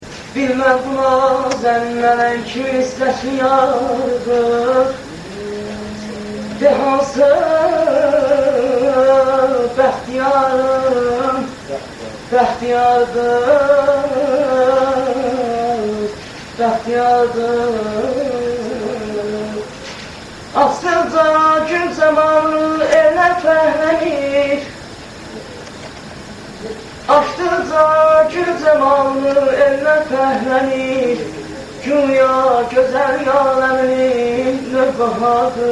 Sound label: mugam rast